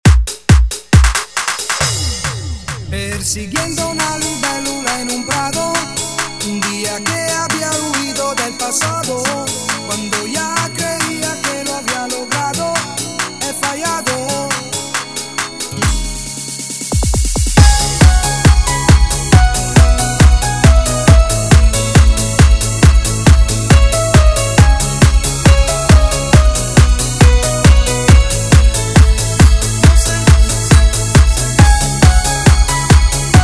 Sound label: intro 137bpm